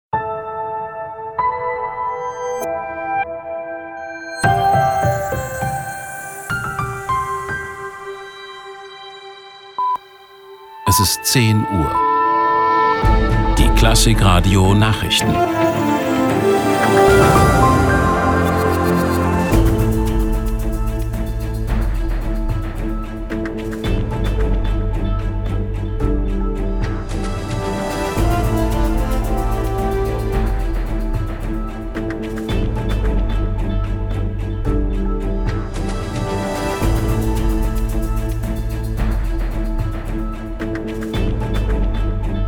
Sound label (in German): News Opener voll